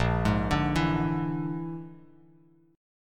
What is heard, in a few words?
Bb-7th Flat 5th-Bb, Bass Standard, Bb7b5 chord; arpeggiated; JGuitar